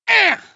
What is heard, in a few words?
hurt med; cj